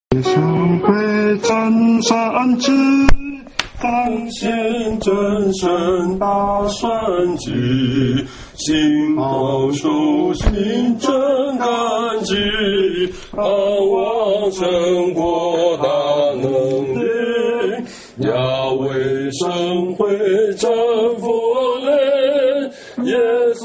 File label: hymn; worship